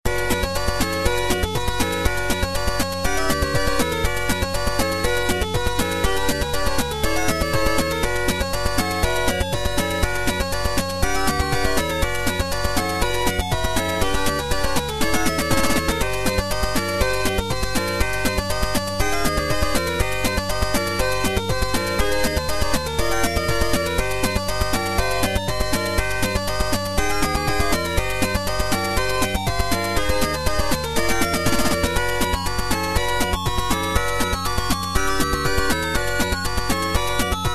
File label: bgm action